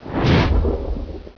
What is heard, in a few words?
Die; Virus: The Game